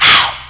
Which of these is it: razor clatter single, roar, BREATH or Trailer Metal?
BREATH